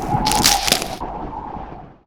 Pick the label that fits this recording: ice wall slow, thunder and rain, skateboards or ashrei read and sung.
ice wall slow